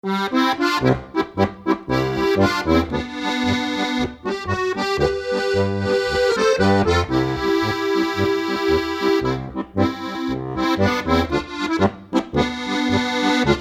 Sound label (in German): GCFB Lied, harmonika